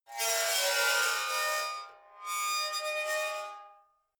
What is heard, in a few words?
metal scrape rich slow